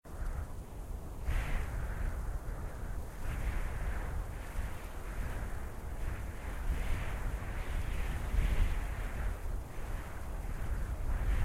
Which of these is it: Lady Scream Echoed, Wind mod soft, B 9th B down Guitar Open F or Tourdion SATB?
Wind mod soft